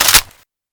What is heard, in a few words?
car clip in, weapons; deluge